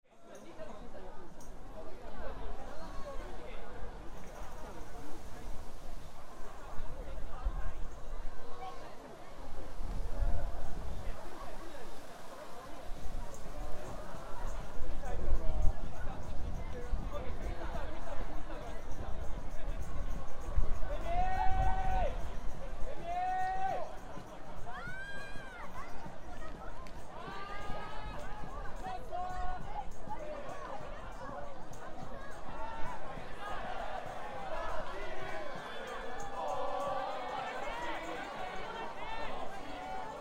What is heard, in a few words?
graduation